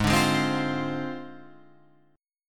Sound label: G sharp 6th Flat 5th G sharp, chord; strummed; JGuitar